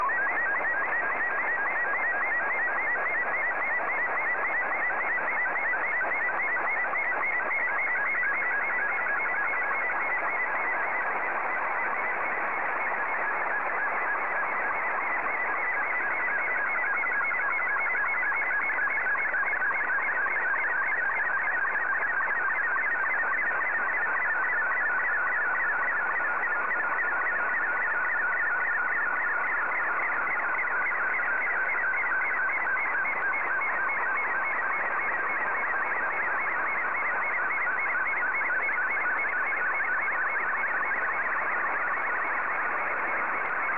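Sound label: Scottie 2, slow-scan television; ZL2BMH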